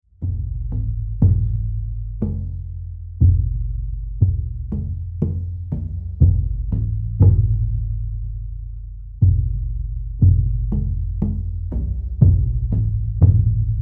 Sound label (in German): Klang Membranophone